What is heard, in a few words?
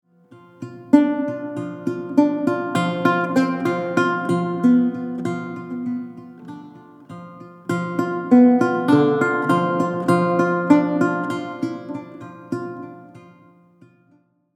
Baroque Guitar